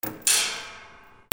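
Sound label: percussion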